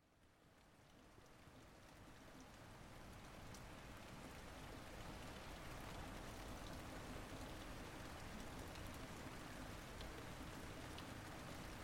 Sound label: sounds of night rain, Dunedin, NZ